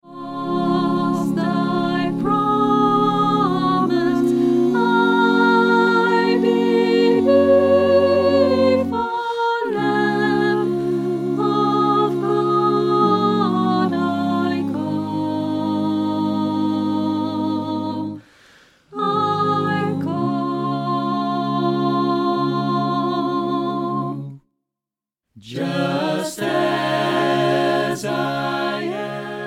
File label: Gospel Choir; Just As I Am